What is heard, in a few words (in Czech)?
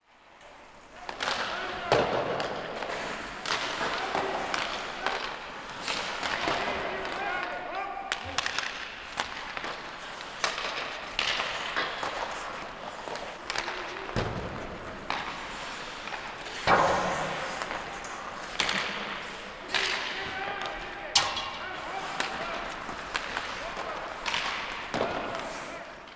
HOKEJ TRENING